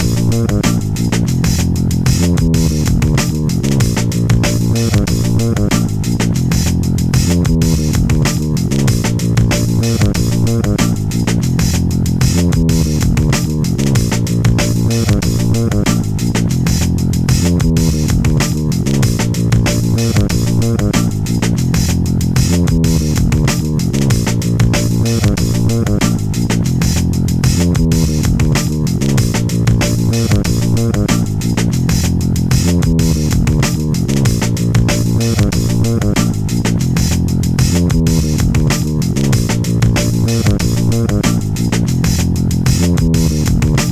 Load Loop; NBA Street; GC; PS2

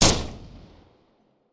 Rifle Shot; Blastmorph Pinball; xbox series xs

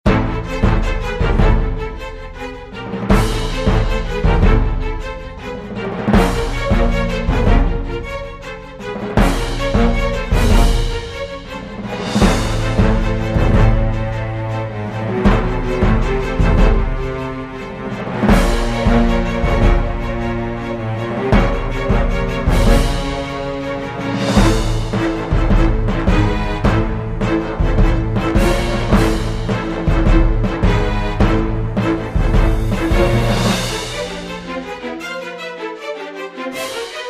bgm danger; Kardia Fantasy Mamono Hime-tachi to Bouken Monogatari; android

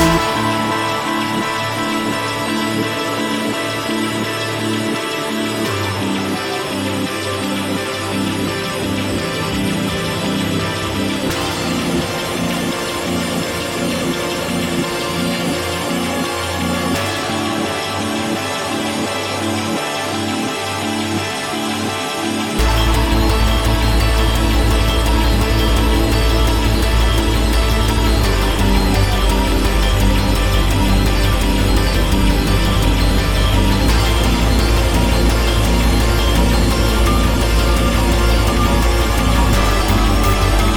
Boss Wavefight; rive wreck hack die retry; ps4

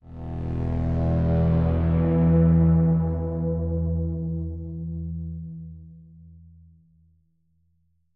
Synth E Long

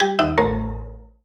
Solo Xylophone Negative; MUSIC EFFECT; startide

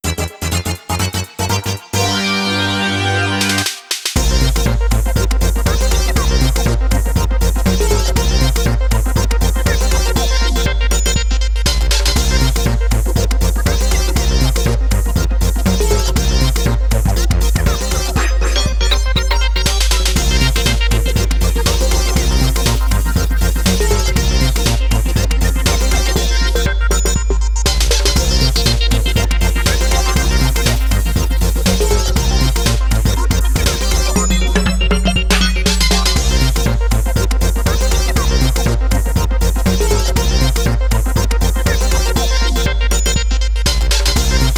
Quiz Time!; paper mario the thousand year door complete; GameCube version

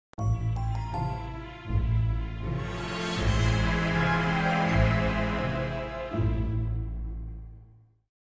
Level Failed; Inside Out Thought Bubbles; Android

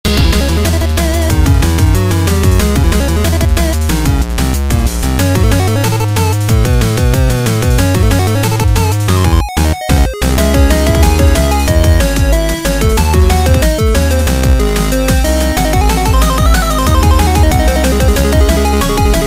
Level Boss; katatak; android ios mobile gamerip

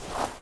Grass; Blastmorph Pinball; xbox series xs

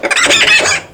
water balloon miss; Birthday Party Bash; Wii

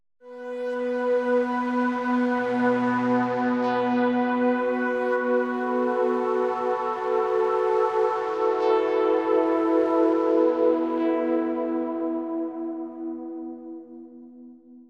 Synth B Long

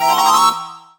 wipe; yuusha no kuse ni konamaiki da. yu nama the puzzle; ps vita

